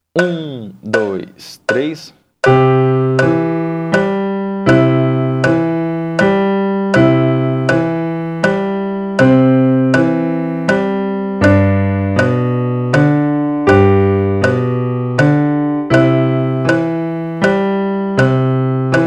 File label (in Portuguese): Minha primeira Valsa 80 BPM